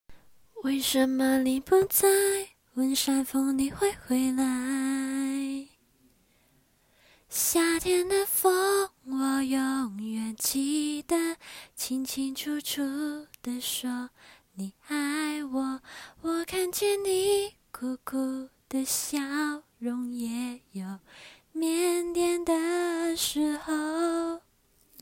清唱

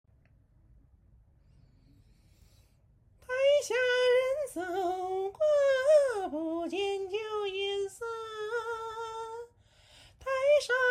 戏曲腔 节选清唱

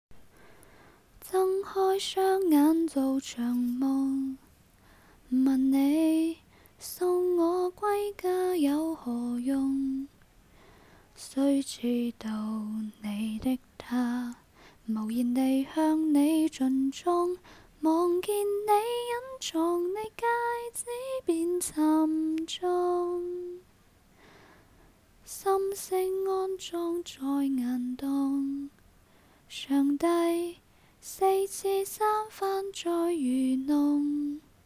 清唱

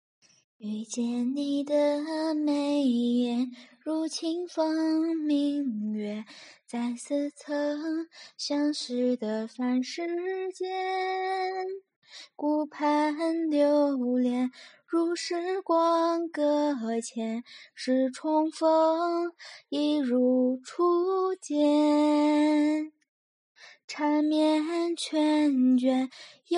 清唱

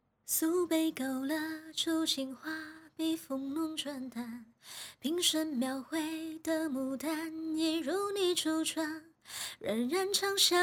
清唱

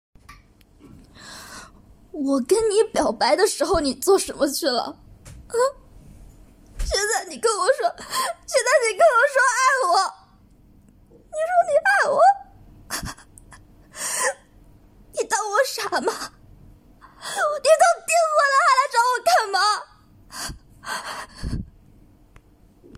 戏感哭

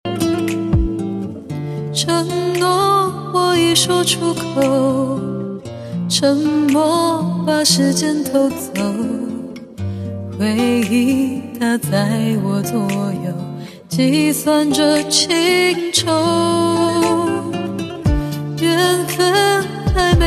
翻唱

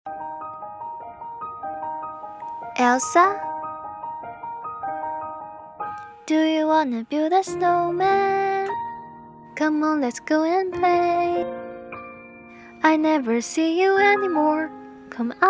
冰雪奇缘童趣版英文

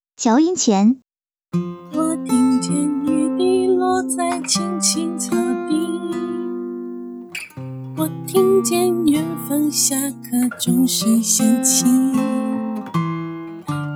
调音对比

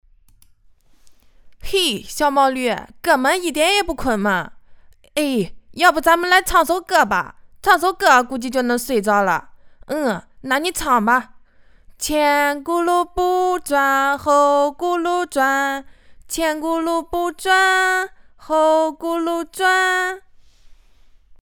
唱首歌

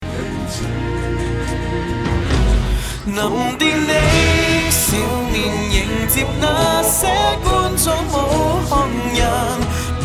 粤语男声歌唱有伴奏

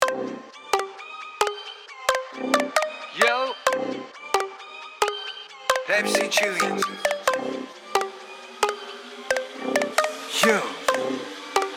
配唱; 百事可乐

